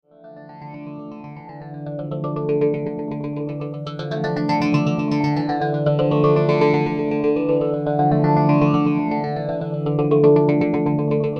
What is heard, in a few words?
Arp